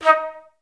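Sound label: flute staccato D#4; Best Service; Peter Siedlaczek's Advanced Orchestra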